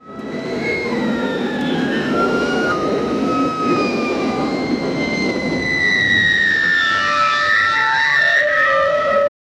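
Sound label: immersive roller coaster; amusement park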